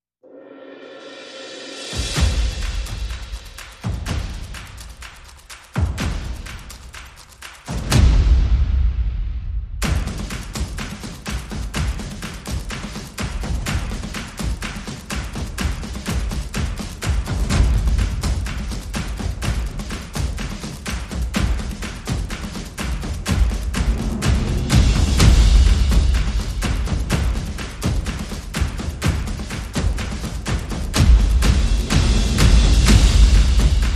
Perc and FX

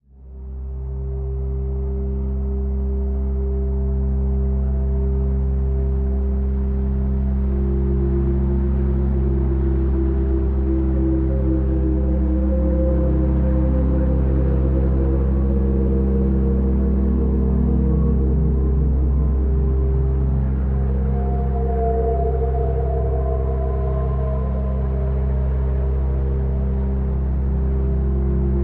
Windy Drone

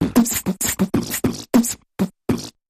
Big Mouth Percussion Variation

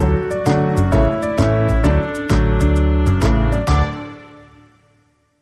Bumper

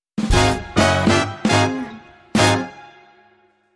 Blues Short Sting

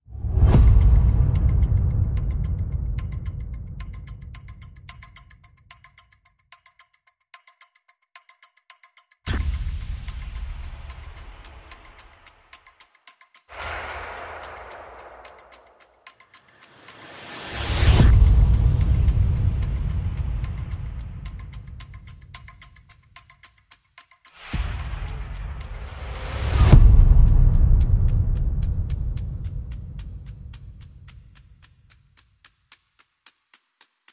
Perc and FX Only

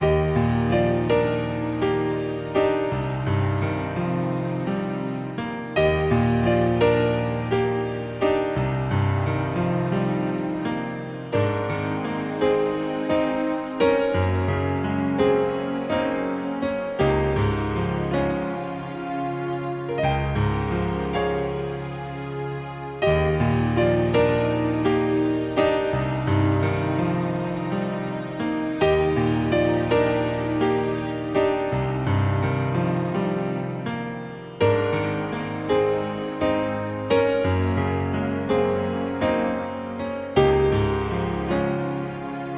Piano & Strings

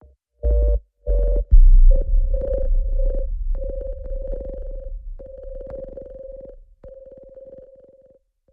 Sub; Fltr Sovereignty

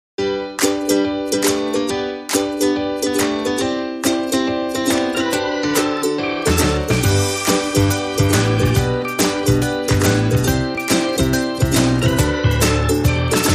No Brass

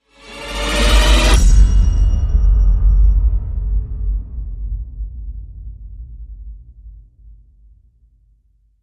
Hit Tremor

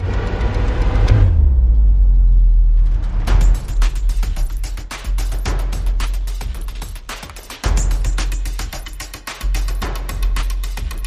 Perc and FX Only

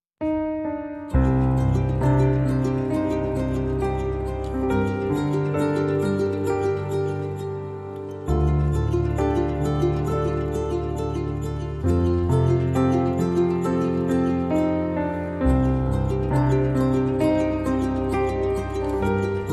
No Strings

